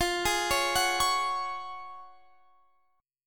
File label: F sharp Major 7th Suspended 2nd, F